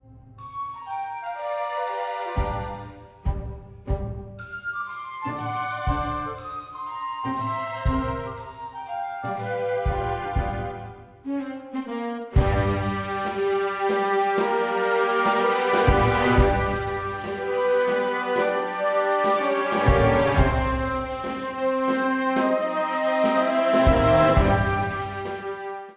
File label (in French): orchestre symphonique